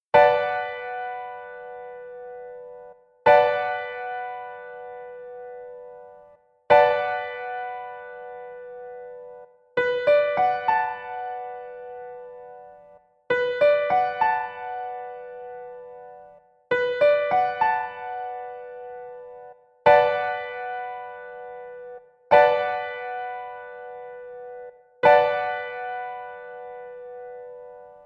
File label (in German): Hm7 Piano Chord